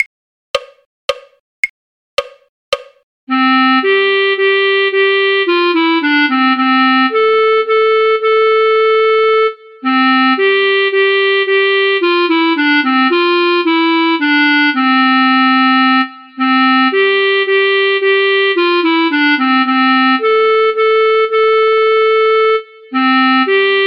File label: clarinet; lavenders blue; folk